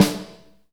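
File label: snare, Medium Rock; Northstar; Drumscapes Roland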